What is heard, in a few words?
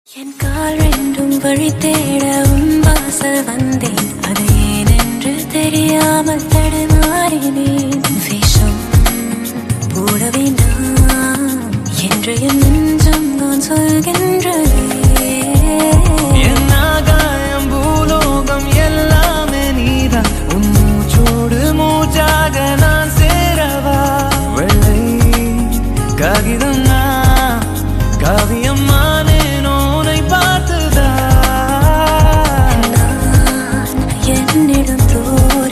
fast beat; tamil; kavalai vendam